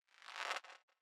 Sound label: zap